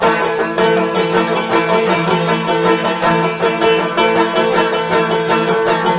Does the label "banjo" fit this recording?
Yes